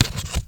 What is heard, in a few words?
Write Draw With Chalk On Board